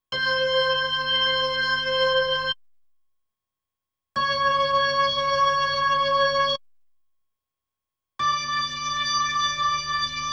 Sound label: B3 Organ; Masterbits CLIMAX 8